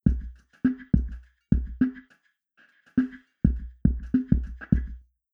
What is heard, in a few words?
drums impulse centre on bank; 39m; Goseck